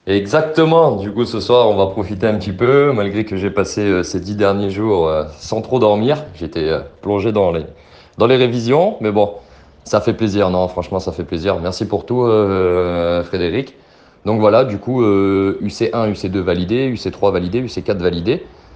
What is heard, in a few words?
vocal